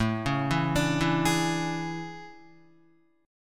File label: A Major 11th, down Guitar; AM11; arpeggiated; JGuitar